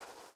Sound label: footstep burlap sand walk; Rust